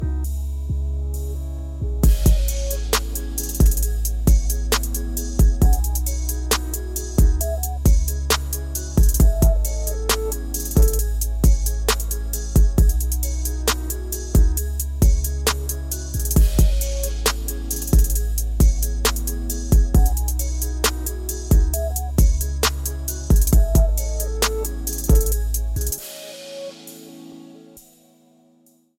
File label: Trippy Smooth Hip Hop